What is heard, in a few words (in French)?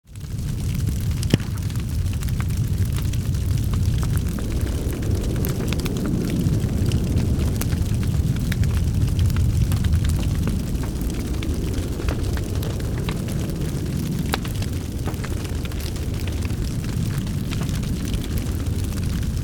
Feu, Armes; bruitage